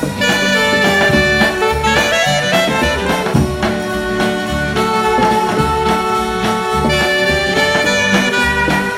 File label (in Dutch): loopje